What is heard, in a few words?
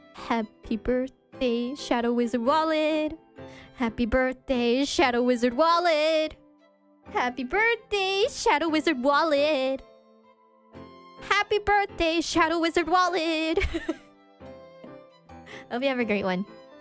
Singing; IRySinging